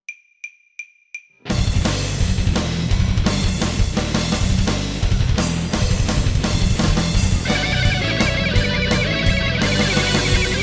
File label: sans vocals; Trogdor